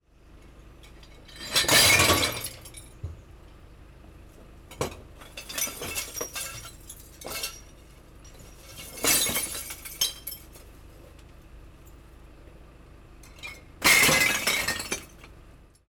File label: breaking glass waste collection